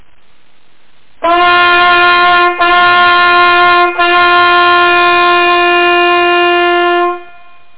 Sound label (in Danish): holdt, jagthorn